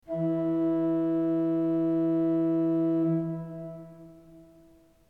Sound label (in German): ton, orgel